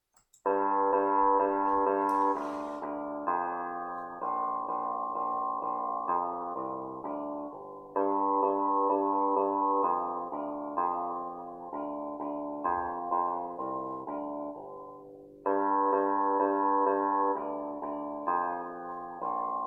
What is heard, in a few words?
bass; Bransle de Champaigne